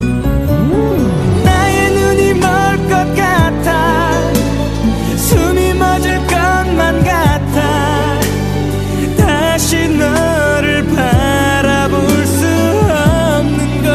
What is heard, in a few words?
Arab Sad Love